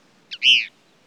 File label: Cassins Kingbird